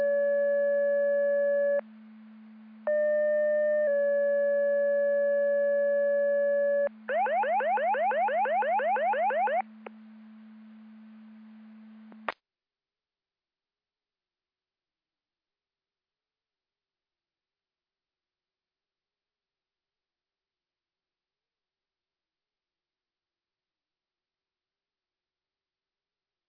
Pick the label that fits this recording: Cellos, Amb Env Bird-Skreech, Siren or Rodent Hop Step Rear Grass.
Siren